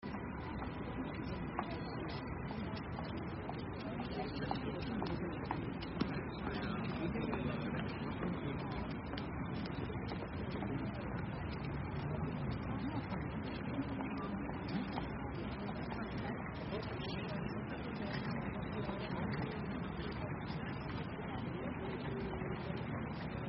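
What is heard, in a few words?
Ambience Town General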